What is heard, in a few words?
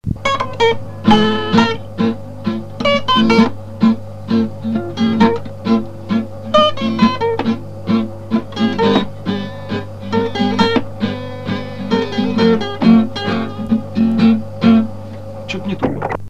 tango